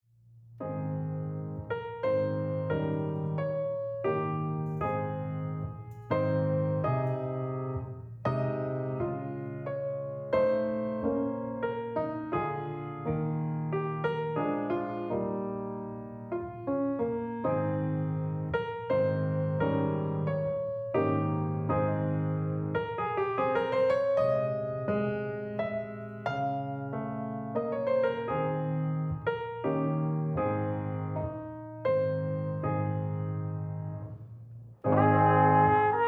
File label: Trumpet Concerto; Haydn Trumpet Concerto